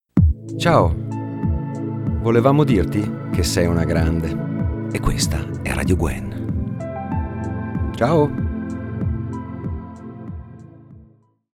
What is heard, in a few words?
Jingle